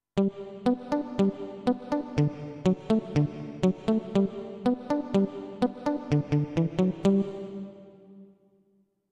Muted Guitar

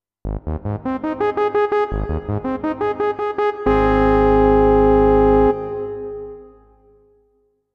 Dynamic Saw

